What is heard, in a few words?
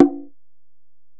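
Acoustic Percussion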